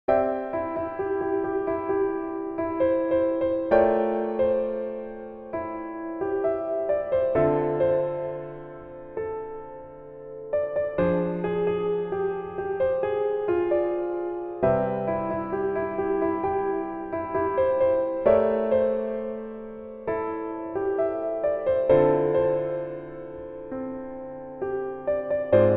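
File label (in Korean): Piano version 멜로디MR 남자키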